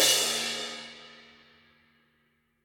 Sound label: drumset g#; Golden Crayon Sounds